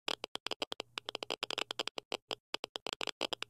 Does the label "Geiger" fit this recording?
Yes